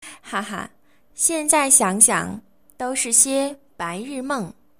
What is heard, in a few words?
haha